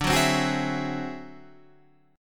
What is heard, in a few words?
D 9th Flat 5th D, guitar